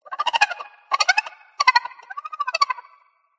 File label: squeaky metal Designed Alien Bird; PMSFX